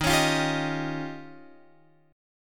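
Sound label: E flat 13th E flat, down, Guitar, Open F; strummed; JGuitar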